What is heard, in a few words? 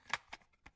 Tape